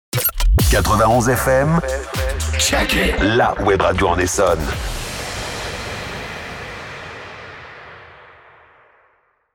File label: Sweep ID; 91 FM; Essonne